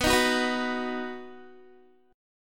B Major, Guitar, 4E,4A,5D,5G,5C,6F; strummed; 19,18,16,16,18,x

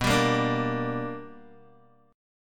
B Minor Major 7th Sharp 5th Guitar; BmM7#5 strummed

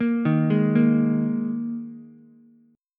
Ab Suspended 2nd chord, Ukulele; 3,3,4,1; arpeggiated; JGuitar

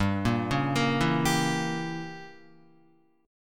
G Diminished 7th chord, down, Guitar; arpeggiated; JGuitar

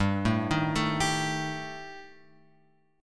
G Suspended 2nd Sharp 5th; Gsus2#5; {3 0 1 2 X 3} arpeggiated

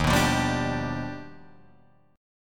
D sharp Augmented Major 9th D sharp Guitar Open D

